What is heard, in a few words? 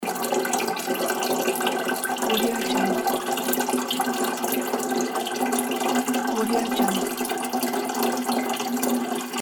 water flow